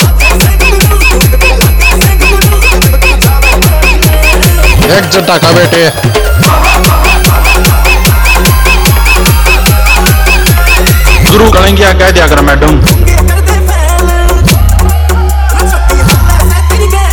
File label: Blast EDM ReMix